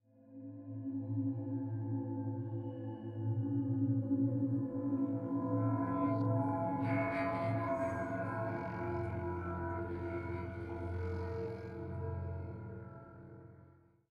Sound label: Idle, 85bpm; Legends of Pegasus; windows gamerip 2012